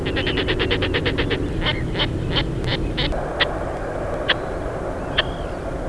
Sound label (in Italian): Svasso collorosso, uccelli